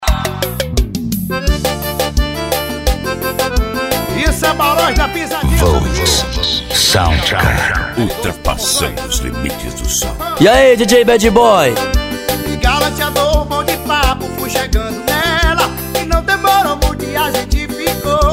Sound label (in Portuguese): sertanejo e pisadinha; cd volks sound car